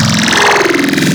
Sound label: Growl Table, Serum; Cymatics